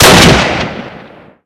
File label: kar shoot, weapons; svencoop; ww2projekt